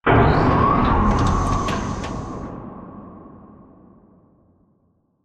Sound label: Giant Floor Panel Arrive Start; Boomtown; Sideways Town; Titanfall 2